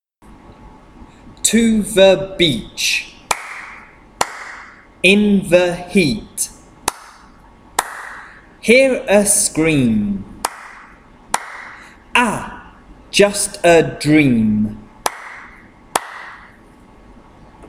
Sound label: Medial Long Vowels Chant ea